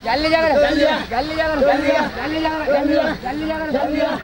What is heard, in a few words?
VOC, India; Best Service; Ethnic Journey Sound Cube